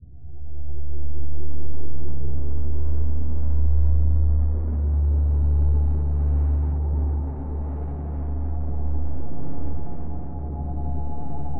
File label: Deep Drone; Cinematic Trailer Music; Bonus - FX & Transitions; BlueFX